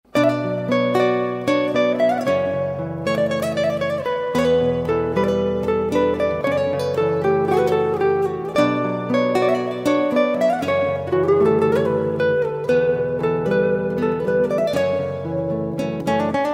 instrumental zing iphone ringtone; iphone ringtones

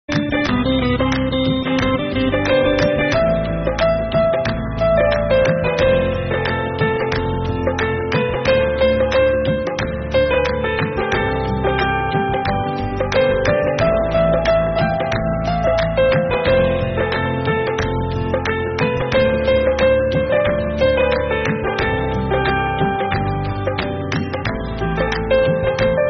instrumental ringtone; bollywood

